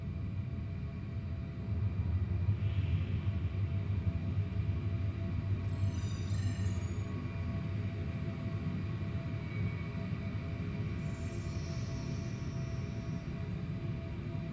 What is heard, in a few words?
shuttle, ambience; sf2e; guilt of the grave world